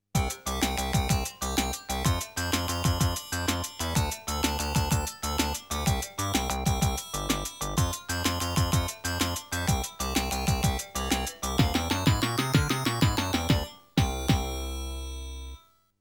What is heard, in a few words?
ENDING COMICAL; sengoku ace